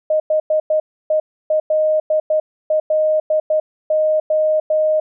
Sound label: morse hello 12wpm